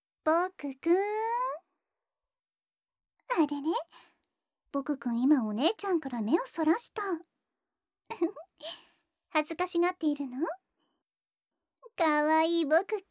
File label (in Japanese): 耳舐め, ASMR; 耳舐めとスケベな挑発で自慰強要→ご褒美にムチムチお姉ちゃんのぐちょぐちょザーメン裸ズリ